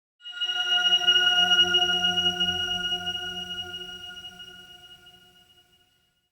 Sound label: Clan Dojo High Bell; Warframe; Dojo Randoms; Ambience